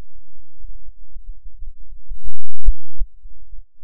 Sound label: Wobble; Ableton Live 10 Suite